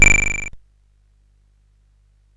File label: Paddle Mania, CSG8580R5